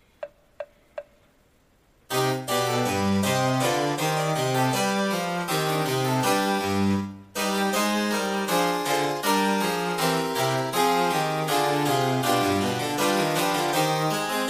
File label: bach trio sonata live rec